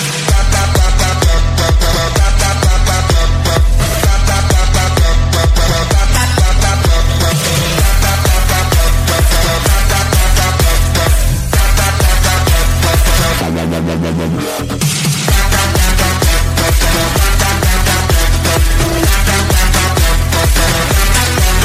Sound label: drop